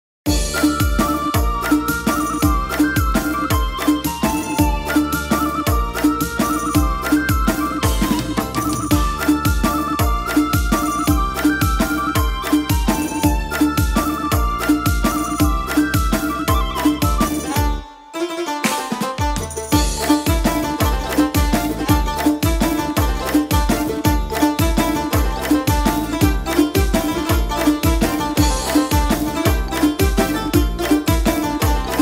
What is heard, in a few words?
Instrumental Version